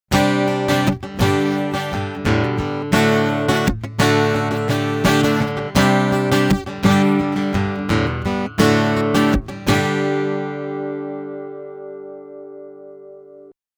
Trace Elliott

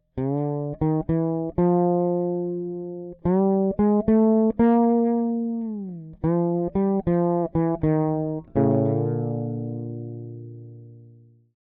Fretless Drive EQ Flat; Tonehammer

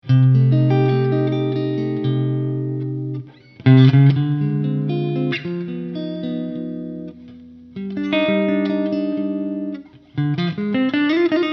hals clean jazzy

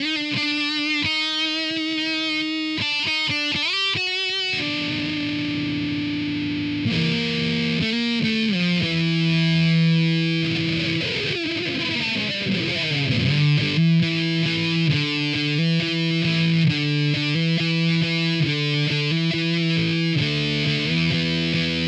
Drive Big Muff; Amplitube X

